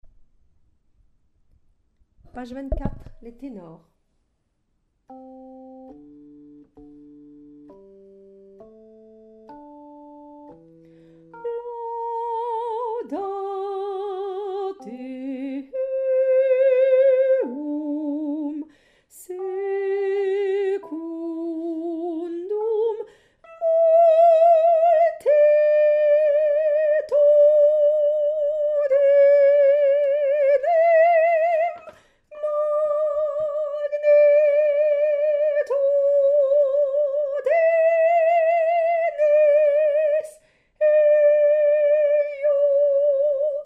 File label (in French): psaume Tenor